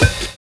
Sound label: KLAXON; Univers Sons - Kitch 70s